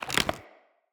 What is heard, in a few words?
Mockery Foley Melee Swing; Enemy; Mockeries; Characters